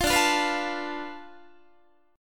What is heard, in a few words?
D-Minor 6th, D, Guitar, 4A, 4E, 5A, 5D, 5G sharp, 6C sharp; Dm6 strummed; JGuitar